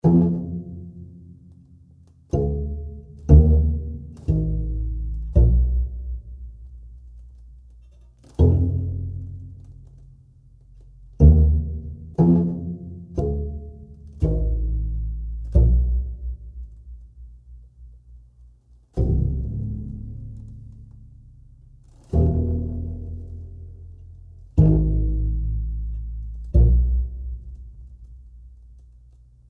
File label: bass